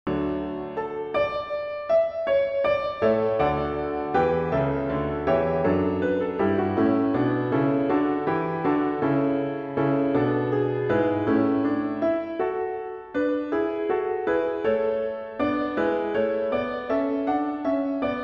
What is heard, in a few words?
Piano Only; O Thou That Tellest; Messiah; Handel